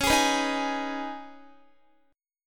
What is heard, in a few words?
Db 7th Suspended 2nd Db, down, Guitar; Db7sus2 strummed; tuning=4E,4A,5D,5G,5C,6F